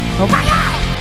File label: doorbell; screampark; TorT Houses; CMHouses